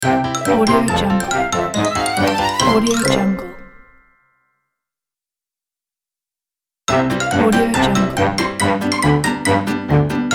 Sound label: cartoon logo animation pack